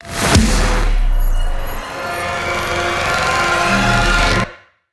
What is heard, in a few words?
combat 176bpm; combat uac 02 176bpm v2 GLORY 05